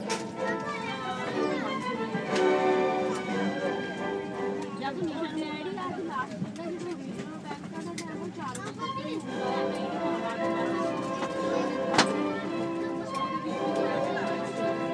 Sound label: the sound of austrian boo